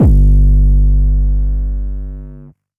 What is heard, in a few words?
808 G; Cymatics